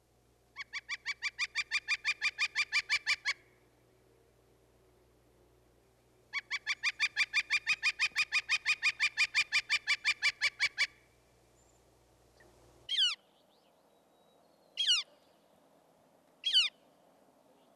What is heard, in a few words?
Northern Flicker; Name That Tweet